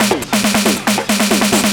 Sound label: drm 138, Techno, drum-loops; rhythm